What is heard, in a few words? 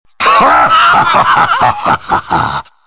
lich laugh